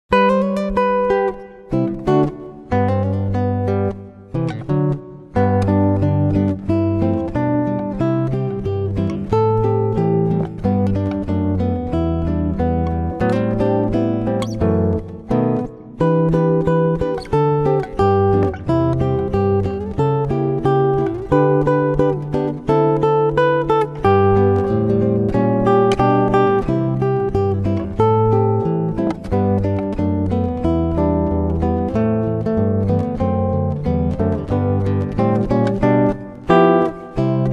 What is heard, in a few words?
Guitarist